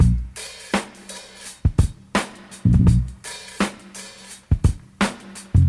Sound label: 84 bpm high quality drum beat A sharp key; drum loops